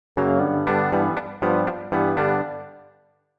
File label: reverb; スモール・ルーム・リバーブ